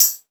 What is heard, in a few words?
PERC, 160 BPM, Drum & Bass